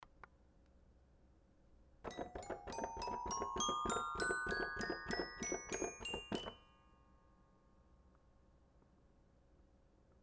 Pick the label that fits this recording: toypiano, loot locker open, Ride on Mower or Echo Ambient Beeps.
toypiano